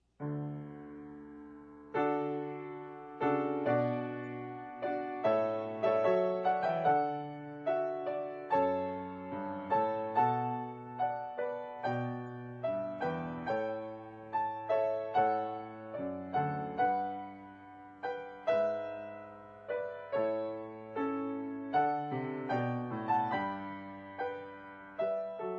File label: piano